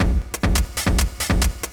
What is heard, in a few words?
Drums